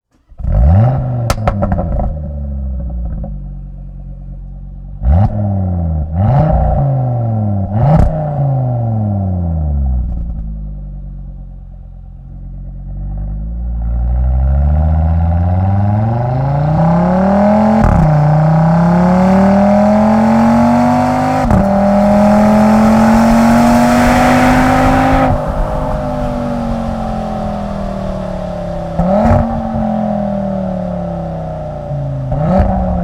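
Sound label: EVOLUTION LINE TITANIUM CUPRA FORMENTOR VZ5, exhaust; akrapovic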